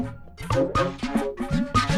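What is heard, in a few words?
Music Base 120; From Dust; PS3; Xbox 360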